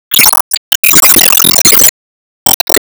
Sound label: Lightningspear Lockon; Disney Star Smash; Android, iOS, Mobile